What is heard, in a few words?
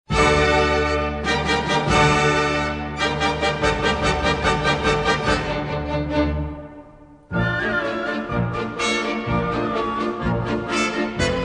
Galop; muzyka k spektakljam; nedorosl mal teatr; tancy